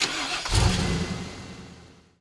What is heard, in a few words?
vehicle suv ignition